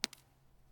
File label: Shell 39mm dirt; STALKER; IWP